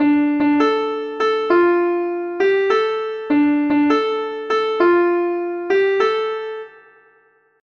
contre rond

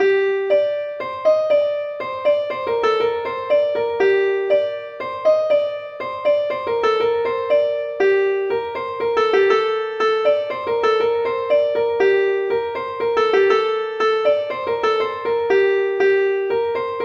suite larides anciens

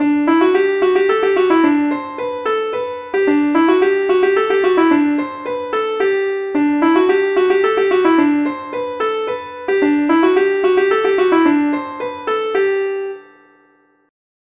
suite polkas

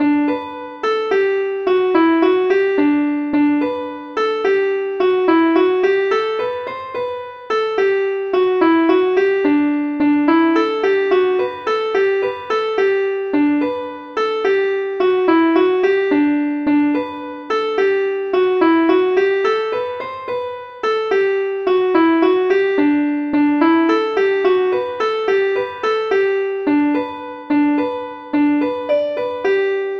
valse ecossaise

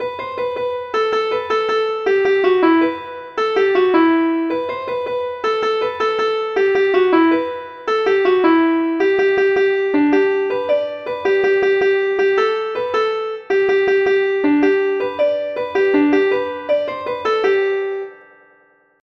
derobee guingamp

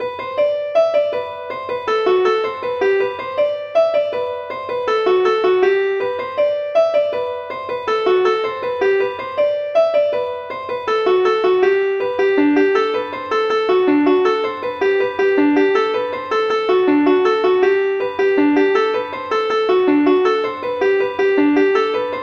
suite polkas

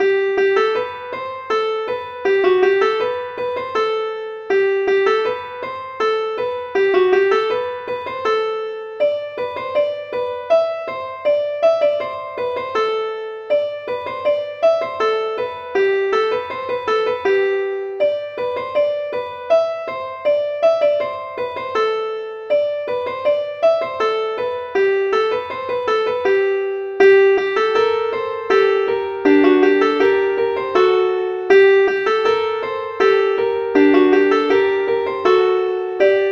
suite hanter

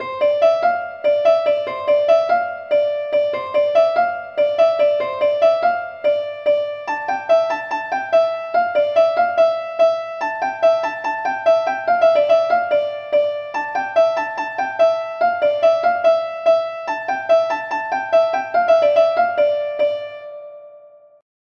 suite plin

